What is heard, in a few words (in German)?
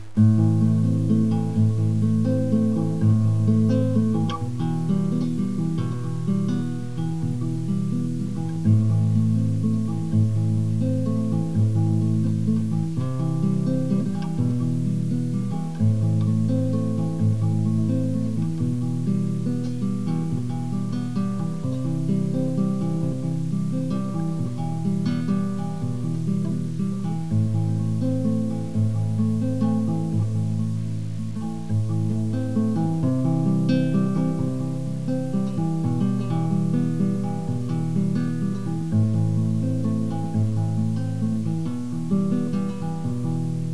guitarre